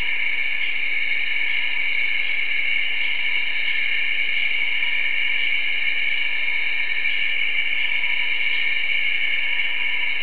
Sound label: jungle night sounds; soak up the sounds of our last jungle evening